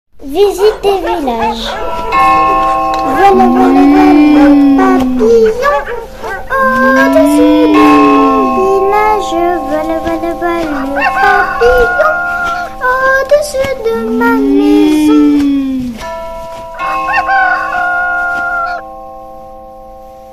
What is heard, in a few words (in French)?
montage page village